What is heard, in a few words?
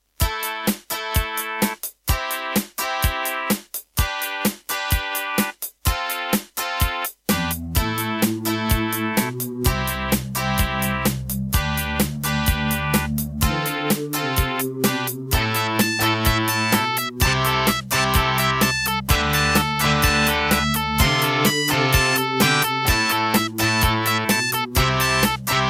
Aztech CS9233 DB; raptor call of the shadows